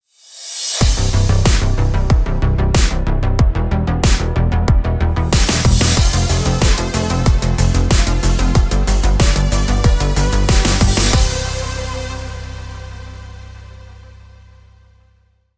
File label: Retrowave Logo, ringtone; MIUI12